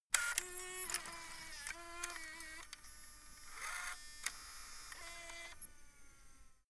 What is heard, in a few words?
servo move click